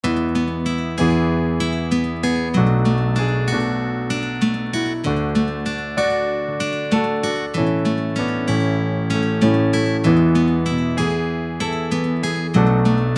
Keys support Guitar Picking

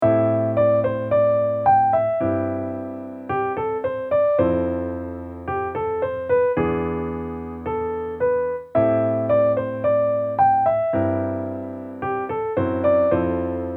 Pop tune melody

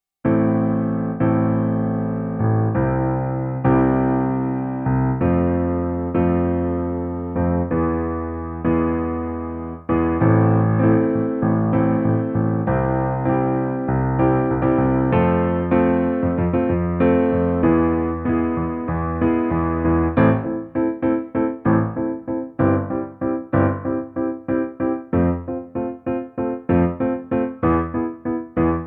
Rhythm examples with 4 note chords

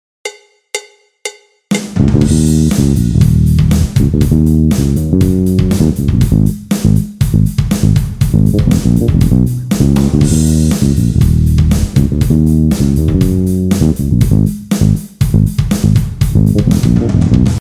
Behind the beat with heavy drums

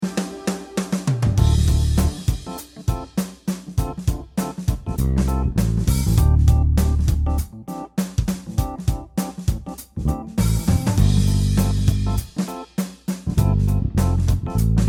CHILL Dynamics

